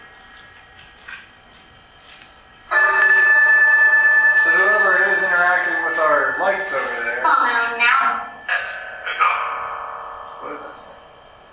Carriage House Phasma; the castle at mount savage investigation

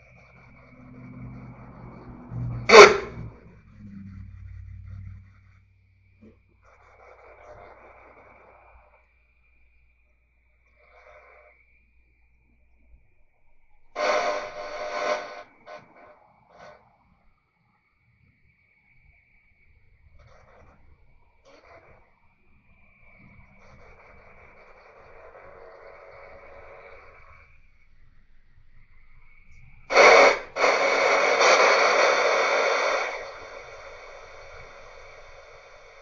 Living Room Portal; historic rainworth lodge investigation

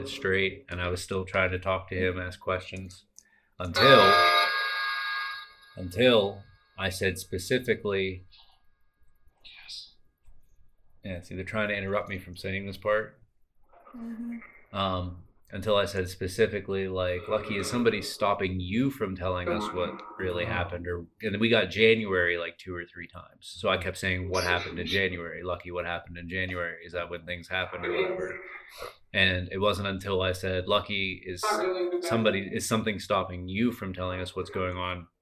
Tarot Card Room Phasma Box

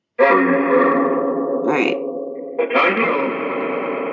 Train Station Chessies Room Phasma Box; Mars Train Station – Return Investigation 2024

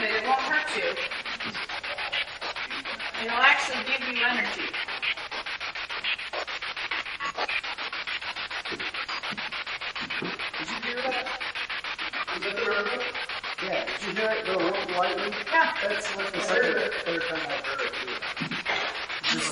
Attic Rec Area Asylum Spirit Box; madison seminary investigation

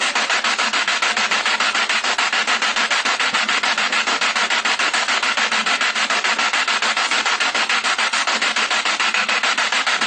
Kitchen Spirit Box

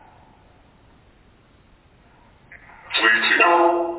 Phasma

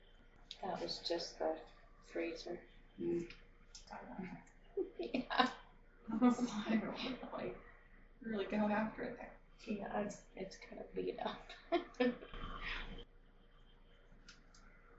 Basement Corner EVP Enhanced; caliente pizza draft house crafton investigation

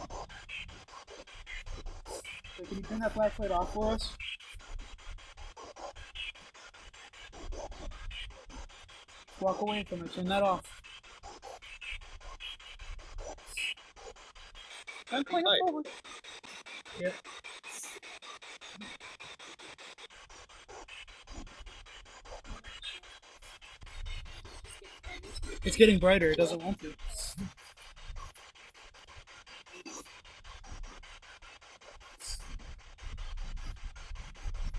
House Spirit Box Light Nope Hello Nope Leave; blennerhassett island; Neale House; case study 4 investigations

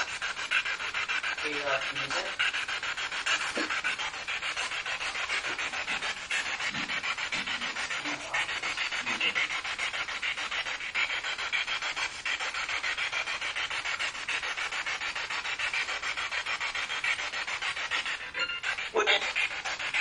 Attic Surgeon Room Spirit Box; madison seminary investigation

